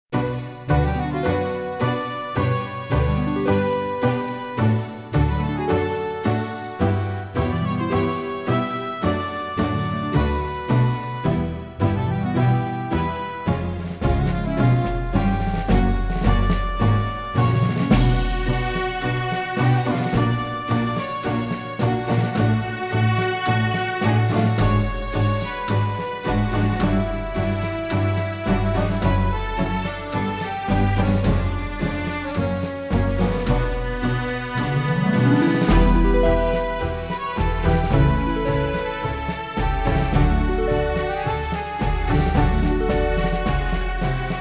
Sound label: Tango